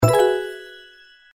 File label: jellymania hit